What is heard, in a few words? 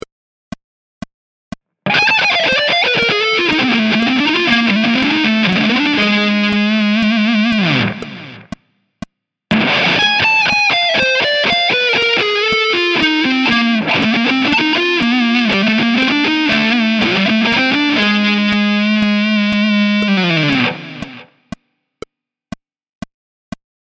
chromatic riffs